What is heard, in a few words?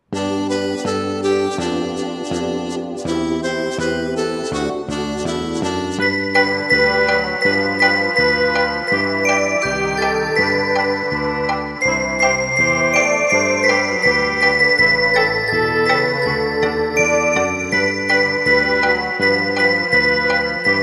Jingle Bells